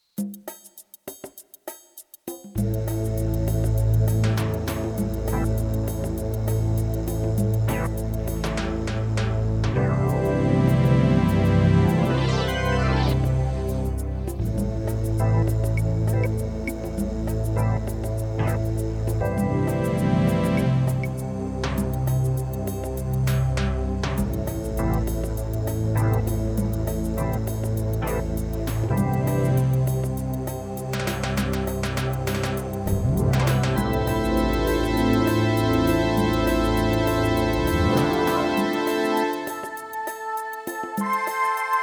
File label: Reggae prelude